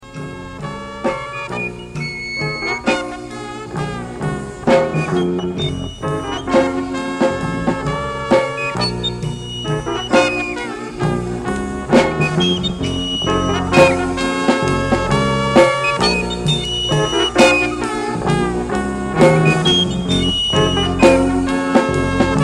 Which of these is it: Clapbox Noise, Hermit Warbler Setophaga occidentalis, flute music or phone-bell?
flute music